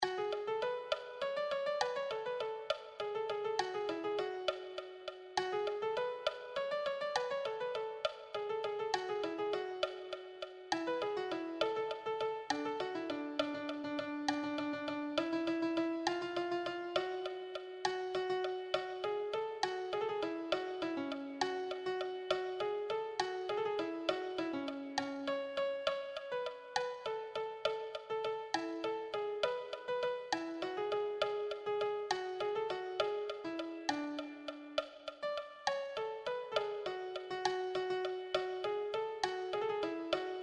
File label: melody